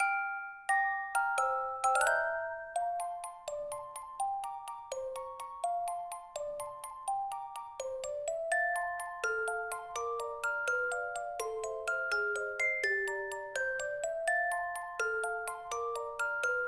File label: Underwater Night; SMM2; SMW